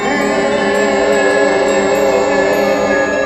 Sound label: G#3 CHOIR; Northstar; The Wizard VOL-1; NERVOSA